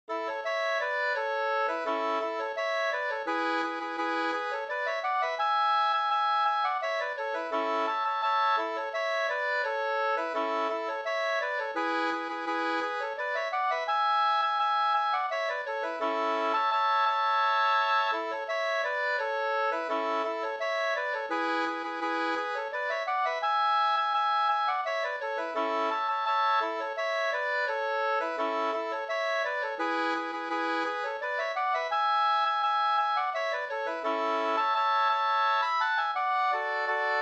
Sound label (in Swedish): vals; Tallon